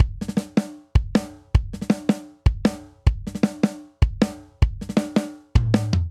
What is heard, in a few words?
Twist Breakdown 157 bpm, Drum Booth; 4 4; MIDI Clips; Ableton; Factory Packs